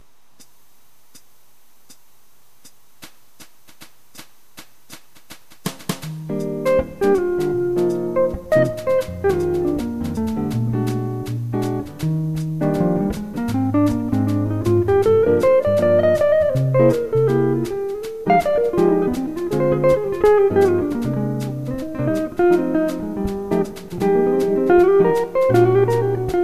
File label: guitar